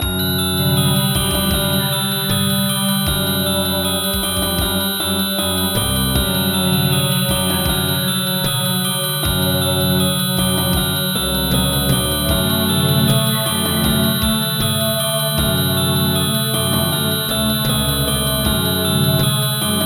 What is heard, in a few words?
horror music; apartment-1303